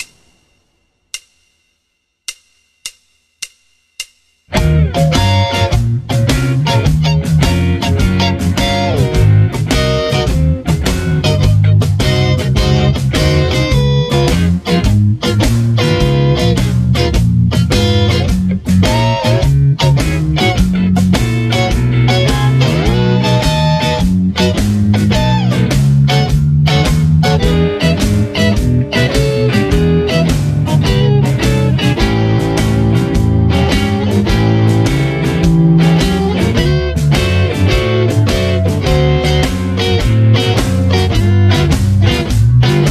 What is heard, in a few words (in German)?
dominanten alto, saxophone